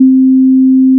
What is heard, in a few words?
middle c adsr sine